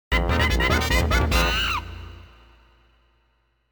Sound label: Success; Donkey Kong 64